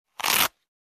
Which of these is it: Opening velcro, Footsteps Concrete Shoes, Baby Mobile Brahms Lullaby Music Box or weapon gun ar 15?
Opening velcro